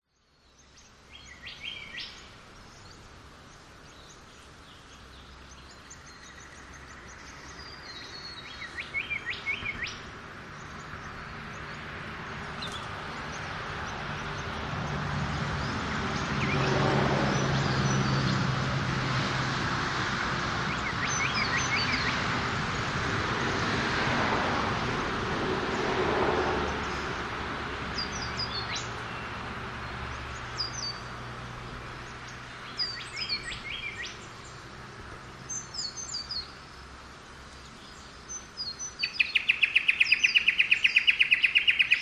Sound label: traffic passing rest area birds wind trees australia; vehicles